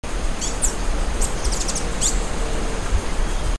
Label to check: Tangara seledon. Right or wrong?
Right